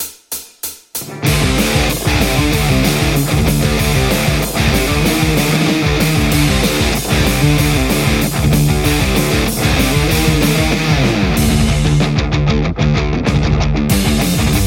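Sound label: metal Telecaster; mercuriall tomato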